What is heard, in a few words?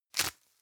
velcro open fast